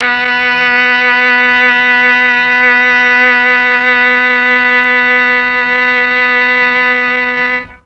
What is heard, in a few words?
B3 mellotron CELLO